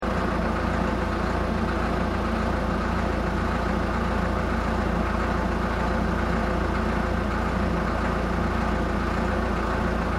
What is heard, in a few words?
Construction Vehicle Road Roller Idle Closeup Loop, Road Construction in Sannohe